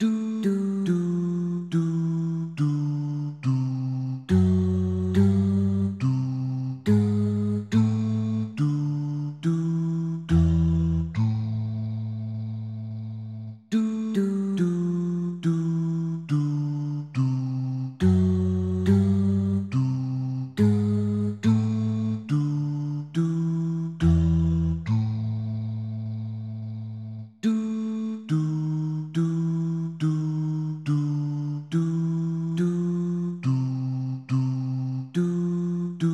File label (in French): choral basse; Bach